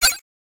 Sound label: button ok; right shot